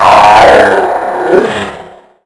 death; quake2; Hueteotl

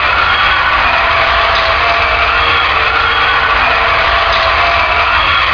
grind, world; quake2; coop

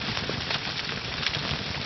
burn, ambient; quake2; tourney

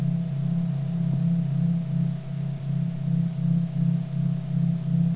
laser; quake2; mnhcoop

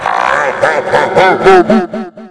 pain; quake2; tourney; Hueteotl

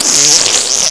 pain; quake2; redux

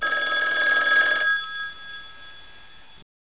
phone; quake2; coop

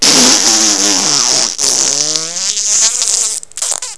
death; quake2; mnhcoop

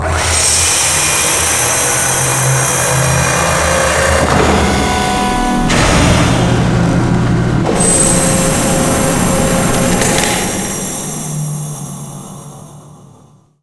comm dish; quake2; mnhcoop

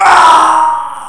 death; quake2; coop

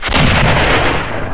boom; quake2; redux

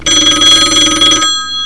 phone; quake2; railz

